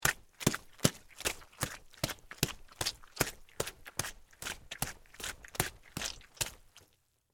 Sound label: Mud Run Splash, Footsteps, Mud, Run, Water, Splash